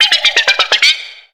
Grito de Finneon

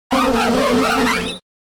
Grito de Vespiquen

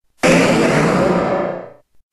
Grito de Mega-Kangaskhan

